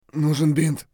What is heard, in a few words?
bear low hp; eft